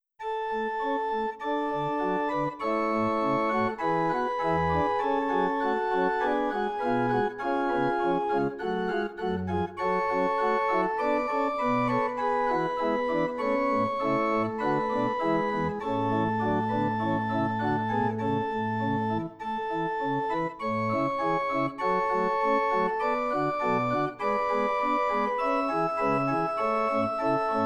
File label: Organ Principal, Yamaha NP-15 pianino cyfrowe; Yamaha NP-15 WH